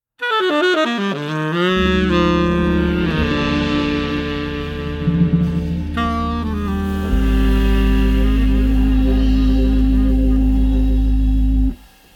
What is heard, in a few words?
ORGAN TRIO